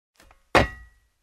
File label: Jump on the metal floor with shoes; steps